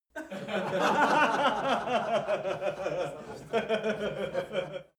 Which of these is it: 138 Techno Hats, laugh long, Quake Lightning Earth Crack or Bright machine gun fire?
laugh long